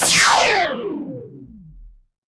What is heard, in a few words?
magic scifi laser